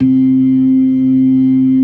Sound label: LOW MAN, LOWER MANUAL, Organ